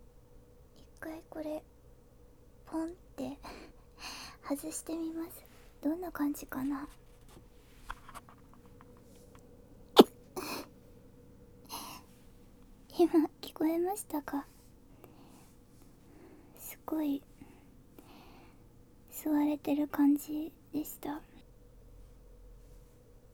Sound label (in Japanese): ニップルサッカー外す時のポンって音